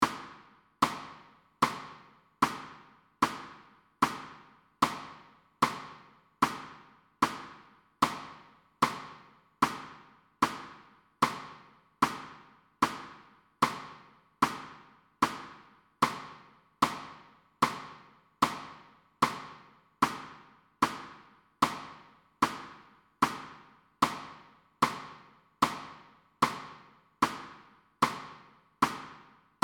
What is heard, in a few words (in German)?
Taktschlag